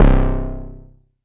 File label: mbrute syn, Arturia MiniBrute; DRUM MACHINES